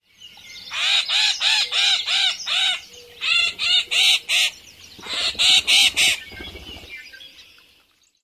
raspy female calls

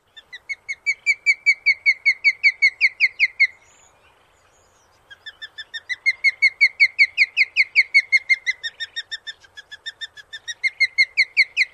Osprey, alarm calls near nest

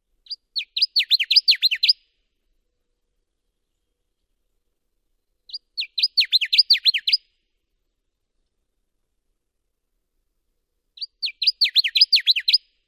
songs

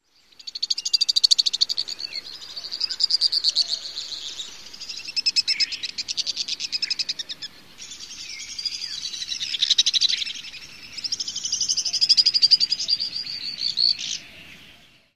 call

